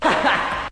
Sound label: "ha ha", Voice